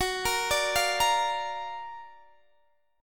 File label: F sharp Augmented Major 7th F sharp Guitar Standard; arpeggiated